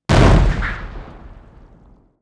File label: bomb explosion